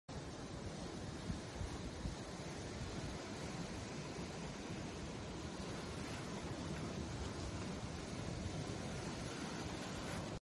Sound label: ocean